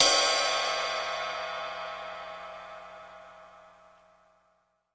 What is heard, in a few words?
pearlkit ride, Pearl drum kit; REAL LIVE KITS